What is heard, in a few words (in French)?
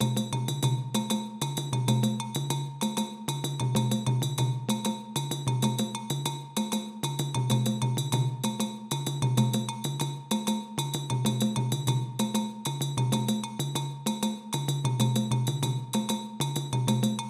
Kakilambe dun a 192 bpm